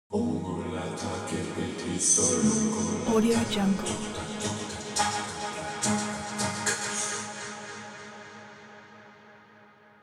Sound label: musical vocoder vocoder vocals